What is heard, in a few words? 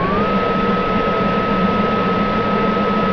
Hover, thrusters; 16bit